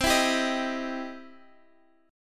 E Minor Major 7th Sharp 5th, C

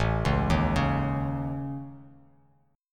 A Major Flat 5th, Bass Standard; AMb5; arpeggiated; JGuitar

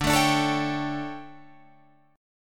D Major 7th Suspended 4th Guitar Drop D, chord

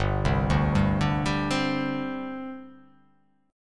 G Suspended 4th G down, Gsus4 chord; arpeggiated; JGuitar

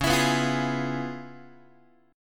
C sharp 7th Sharp 9th Guitar, chord; strummed; C#7#9; JGuitar

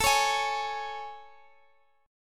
A Major 7th A, Guitar, Open F; AM7; strummed; x,x,x,16,16,15

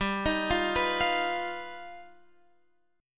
G Augmented 7th, Guitar, Standard; G+7 arpeggiated; x,10,13,10,12,13

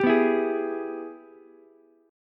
Ab Minor Major 7th Sharp 5th chord, Ukulele; strummed; JGuitar

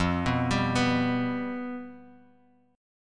F Suspended 2nd Flat 5th, Guitar, Drop D

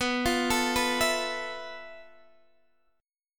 F Major 7th Flat 5th B, guitar chord

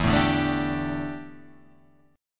D Suspended 2nd Suspended 4th E

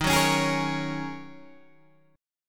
D sharp Minor 11th D sharp Guitar Open G, chord; chordshape

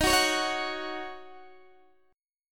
D sharp Minor 7th, Guitar, DADGAD

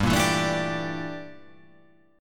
G Minor 6th Add 9th G down

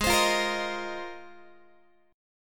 G sharp 6th Add 9th G sharp Guitar; strummed; JGuitar

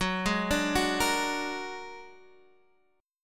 Bb Augmented 7th Gb

